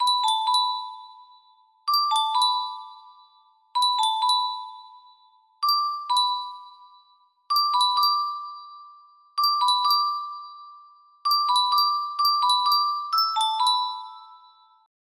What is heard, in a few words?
music box